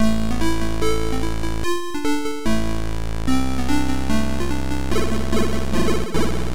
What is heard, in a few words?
Instrum